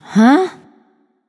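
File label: confused, female; smite; voicepack; hera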